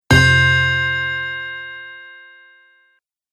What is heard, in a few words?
Big Bell Chime; musical